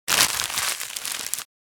Rustling small paper bag

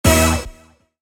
Slot machine casino reel spin symbol hit

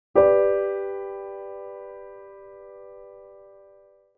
Piano G major chord

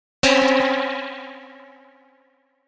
Anime fail accent

